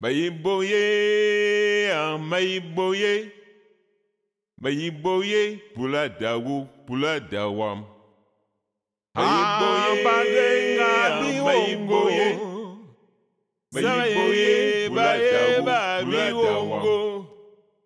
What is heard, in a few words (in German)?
ethno world 5 kamerun; best service